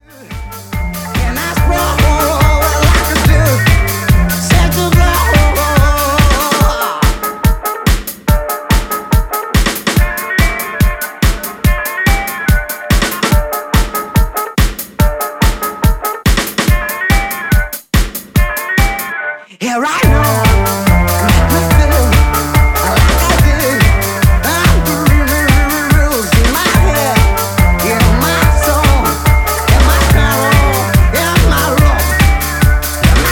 swing

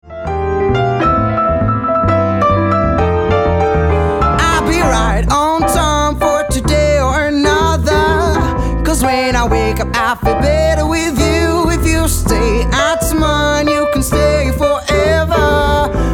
Acoustic

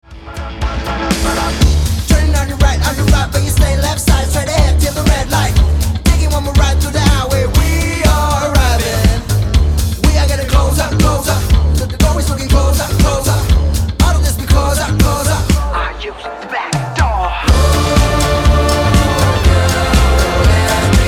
rockabswing

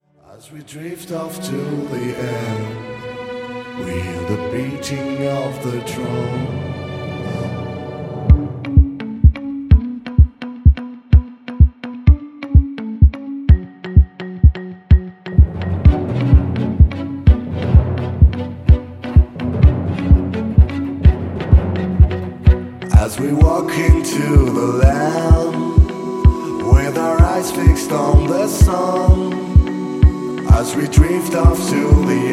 Orchestral

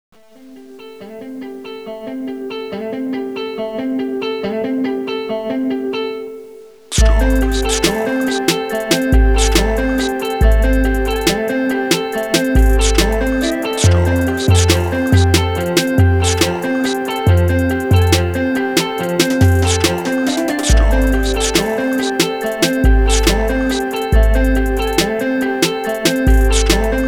Instrumental

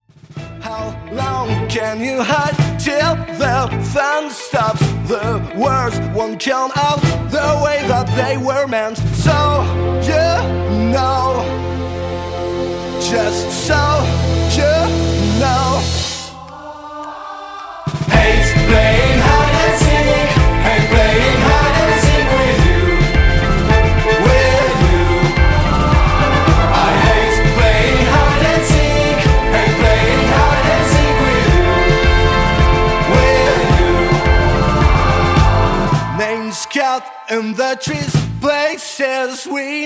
Orchestral

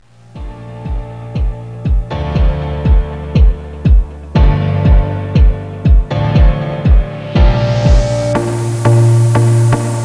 CLOCHETTE INSTRU

